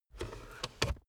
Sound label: car backseat table fold in